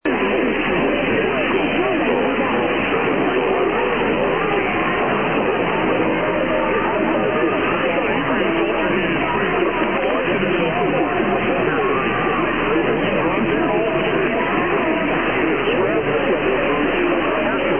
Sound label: Colombian national anthem; HJAD R Vigia